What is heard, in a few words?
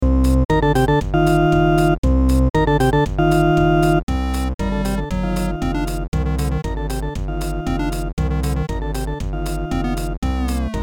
Techno Boss Battle; EarthBound Halloween Hack